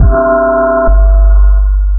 sonar Ping Suit Close Beacon; Barotrauma; Real Sonar

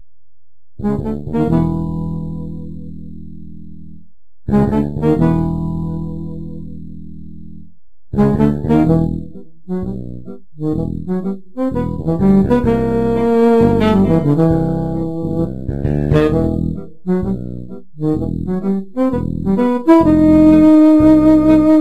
accordion; Epic accordion; Songs; Barotrauma

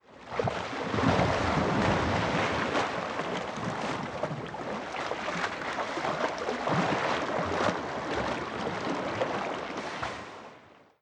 splash Loop, Water; Barotrauma; Subsurface

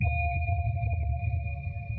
sonar Ping Suit Medium Directional; Barotrauma; Real Sonar

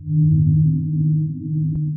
sonar Ping Air Far Directional; Barotrauma; Real Sonar

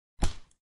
angel step; Footsteps; Barotraumatic

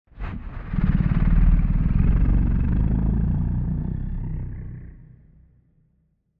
Mud Dragon idle; Barotraumatic

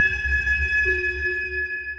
sonar Tail Water Far Shuttle Directional; Barotrauma; Real Sonar

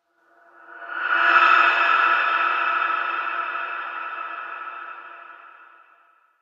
moss; Barotraumatic; Brainmoss1

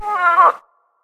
TURTLE negative; Barotraumatic; Psilotoadmonster

